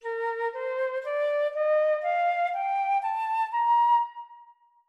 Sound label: major Bb4